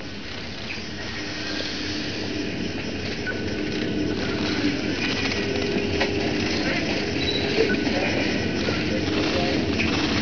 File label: computer loop